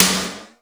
BG SNR, METALIC DRM

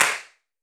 CLAP

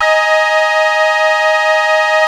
AQUA TARK, Synth

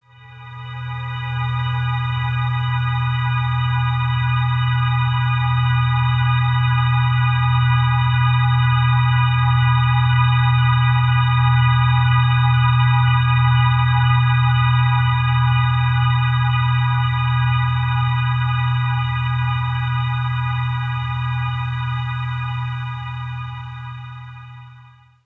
Suspended Glass Chord, Drones; Sonic Foundry (Sony Creative Software)

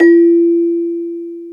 BEL CELES; Roland - LA Composer vol. 1

